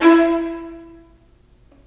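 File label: violins marcato, String Sections; Roland LCDP13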